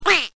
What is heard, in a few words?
duck